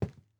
footsteps WOOD; PC Building Simulator

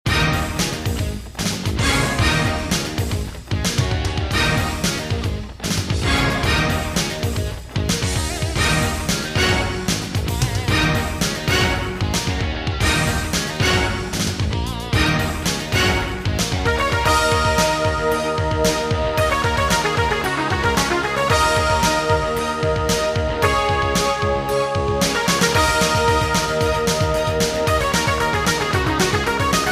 Guitar No Dance; Fuu Un Mokushiroku; Savage Reign; Staff Roll

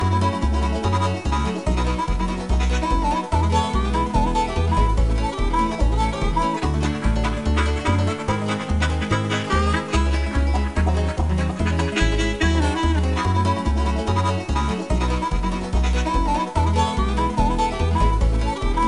Bonus; Extreme Hunting

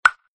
pop sound; Arc of Templar

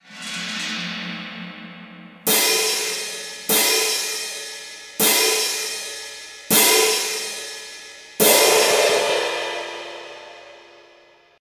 Drums; the legend of zelda skyward sword wii gamerip 2011

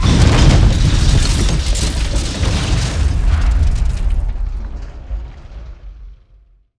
Explosions Building-Collapse

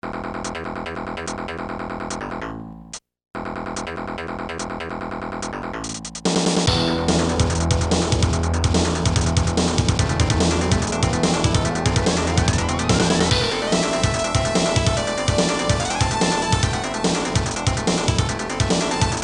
SNARE; genocide square vol. 1; STAGE 3 BOSS

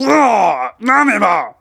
battle wound; Zote

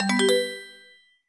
Jingle Start; Moomin Dani no Okurimono; 2009 nds gamerip

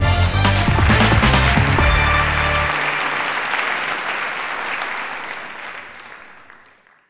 sting applause; Buzz The Mega Quiz; ps2

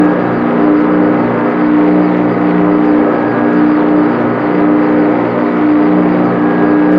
reactor engine

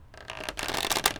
Longbow Pull Back; Timelock VR

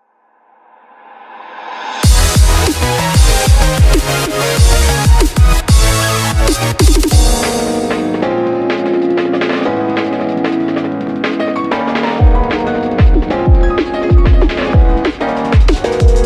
Portal Potty (Instrumental); Friday Night Funkin' Vs. King Post Mortem Mixup

